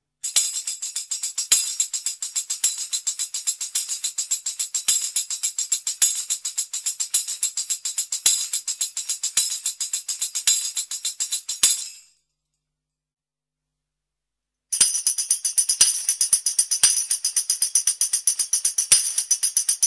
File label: Tamborine sound